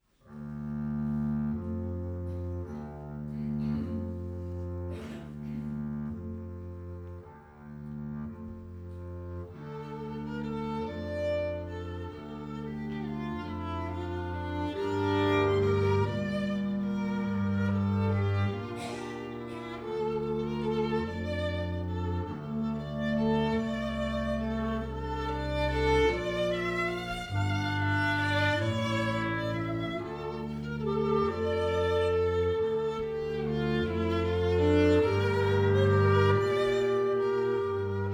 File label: klarinet violin kontrabas